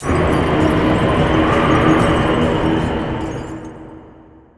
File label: Storm